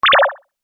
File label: Laser Switch Button